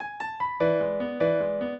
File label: minuet, piano; Mozart